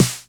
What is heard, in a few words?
909 LO SNR; drum